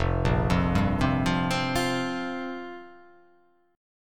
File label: F Suspended 2nd Suspended 4th F down